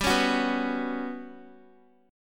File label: G Major 7th Flat 5th, Guitar; strummed; JGuitar